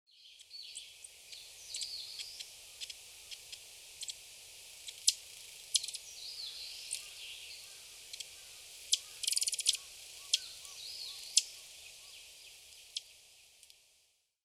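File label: Asian stubtail, hyogo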